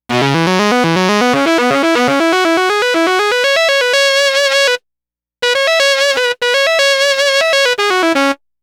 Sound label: Sax Line; Dr. Fink's Funk Factory